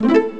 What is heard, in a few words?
Power Up; BeOS